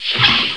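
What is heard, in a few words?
door close; cdromtoday; startrek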